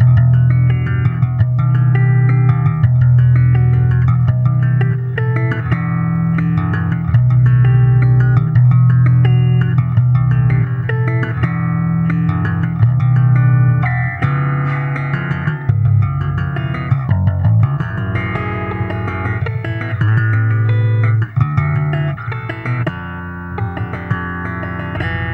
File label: bass intro